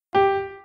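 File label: note sounds; zvuki not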